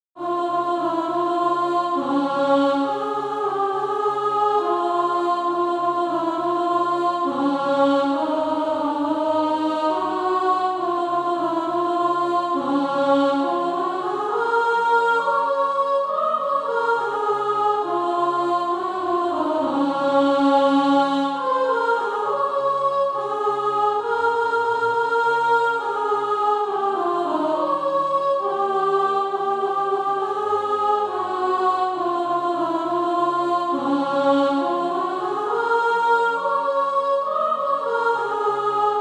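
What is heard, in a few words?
soprano, Practise Music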